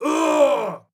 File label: flinch hard; Rust